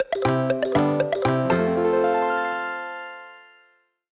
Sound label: intro Free Spin; netent; flowers